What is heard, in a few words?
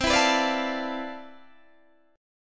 C Major 13th C, Guitar DADGAD